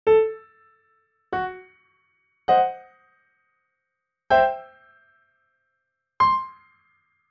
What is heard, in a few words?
duplex scale resonance